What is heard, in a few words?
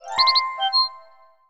Email, notifications; LG G2